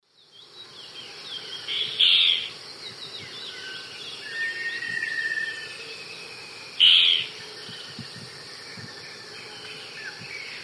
Myrmoborus leucophrys, alarm call; S. America; Eastern Lowlands

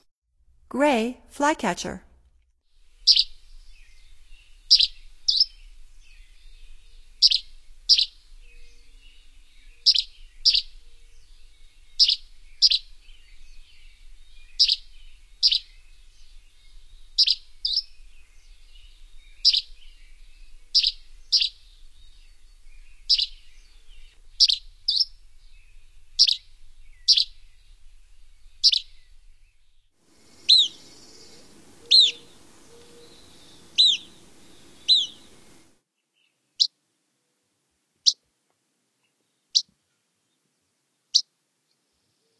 Gray Flycatcher; North America; Stokes

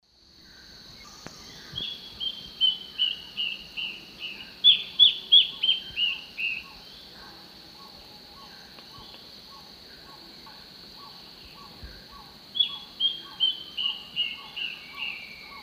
Hypocnemis hypoxantha, duet; S. America; Eastern Lowlands

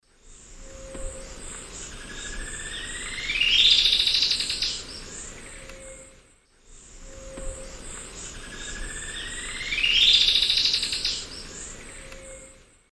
Xiphorhynchus obsoletus, song; S. America; Eastern Lowlands

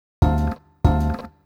Guitar, RnB

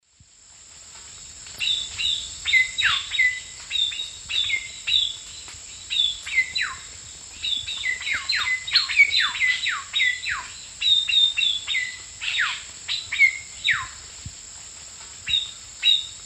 Cacicus sclateri, song and calls group; S. America; Eastern Lowlands

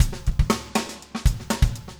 funk 120 bpm, loops

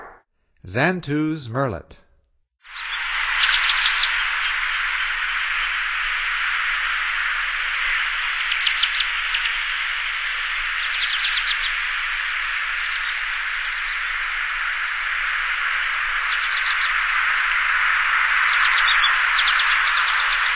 Xantus Murrelet; North America; Stokes

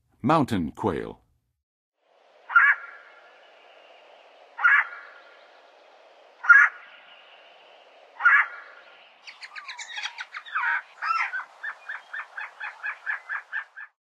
Mountain Quail, Birds of North America; Western USA; Western USA Songbirds

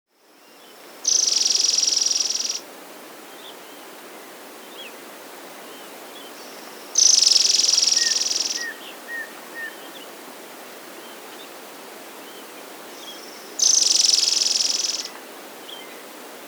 White-Sided Flower-Piercer (Diglossa, S. America

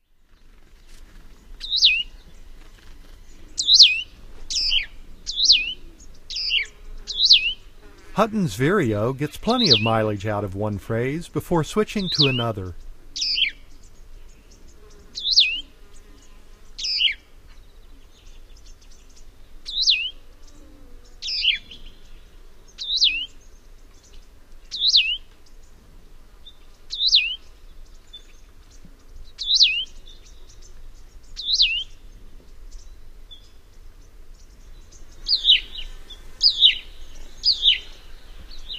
Huttons Vireo, Bird Sounds; North America; Common Western Species; Know Your Bird Sounds

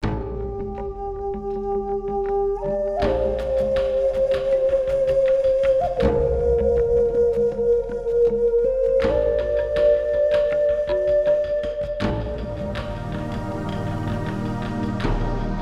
Native Flute; Healing Surrounds

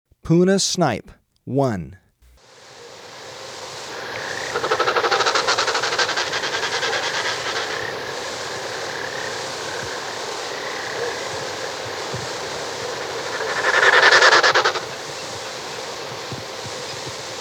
Puna Snipe; S. America

